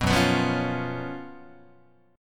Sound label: Gb Major 7th Suspended 4th Gb Guitar